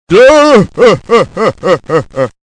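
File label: voice old male laugh; Flying Metamorphosis Queen of SM Bar Fuate Co; android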